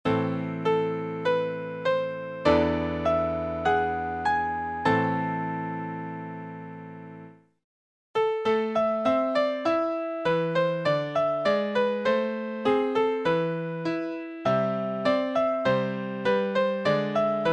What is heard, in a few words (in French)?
mineur; Mineur-tonique; Concert "Barzaz Breiz, la mémoire d'un peuple"